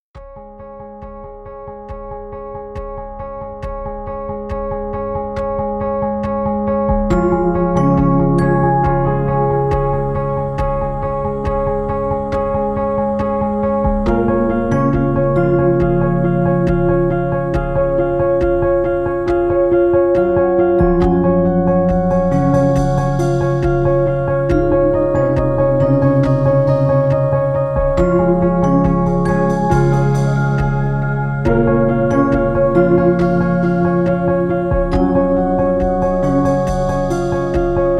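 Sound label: zither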